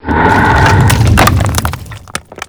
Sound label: attack, eidolon; quakemapping